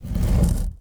SCRAPE Hollow Fade In and Out; mono; Universal Sound FX; SCRAPES & SCRATCHES

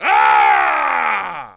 male screem; firefight

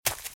Footsteps Leaves Sprint Left; RadwinIsland

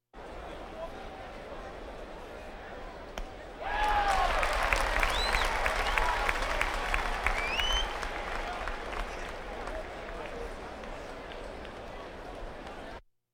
Crowds Race cars Baseball

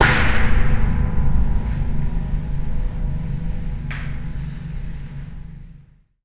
kathwack, Cinematic Metal Impacts

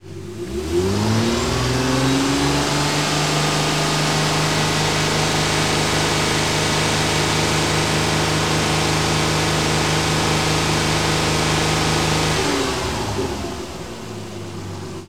Prowler Fast Idle Eng, Automobiles

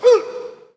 Hockey Male Hurt; SFX Kit; Hockey Vocal Sounds

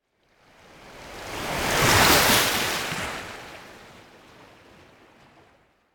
Ocean Strong Wave On The Coast Surf Water Lap; Hollywood Edge; sound designer's toolkit v2

